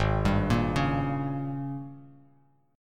G sharp Major 7th Suspended 2nd Suspended 4th, Bass Standard; 4,10,8,6; JGuitar